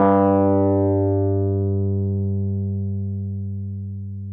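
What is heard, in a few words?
Wurlitzer electric piano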